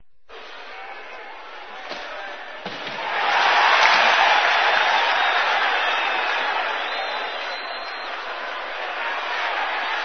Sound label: hockey game ambiance